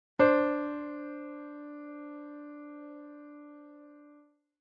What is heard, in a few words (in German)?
ueb Sexte D; Unreine Verminderte Uebermaessige Intervalle